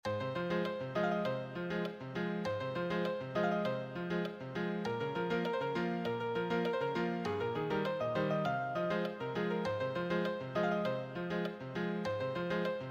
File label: advanced solo, piano